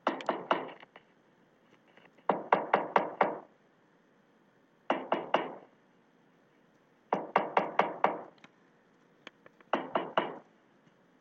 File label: knock